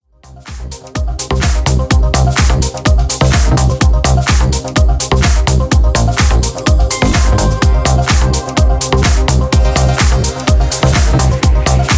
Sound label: Club 126BPM